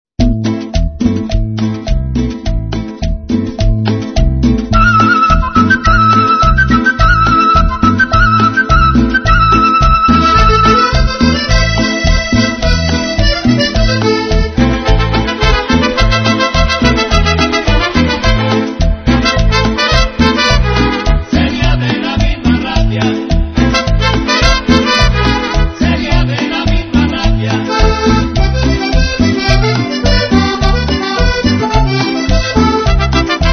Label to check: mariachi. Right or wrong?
Right